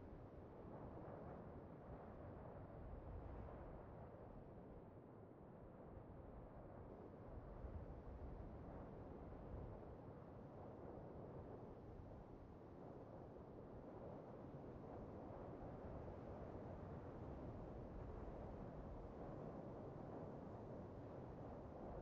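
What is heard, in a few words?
ambience interior closed room; Titanfall 2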